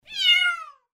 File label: enemy death